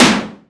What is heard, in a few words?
Borg Snare, drum kit; Polyend; Stazma & Concrete Collage; Concrete-Dual Borg